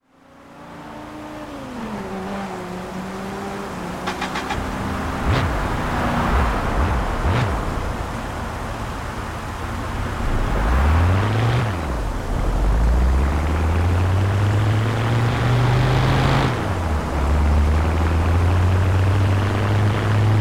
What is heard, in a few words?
mercedes-benz c 63 amg; 2012; TDU